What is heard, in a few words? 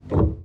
piano